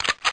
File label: gun ready